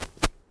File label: Digital Glitch